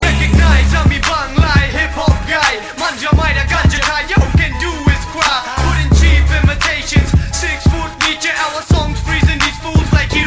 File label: Rap